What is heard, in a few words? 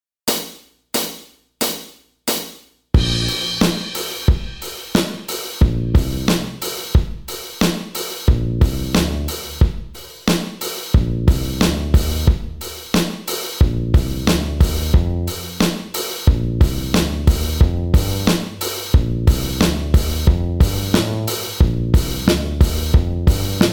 Band Warmups backing track